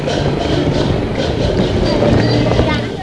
musica strumentale

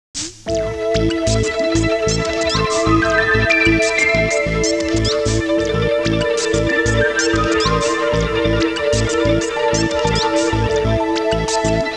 musica elettronica

